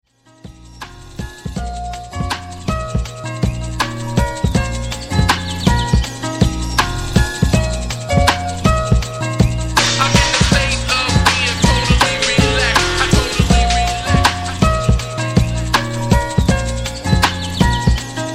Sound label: totally relaxed, prog perc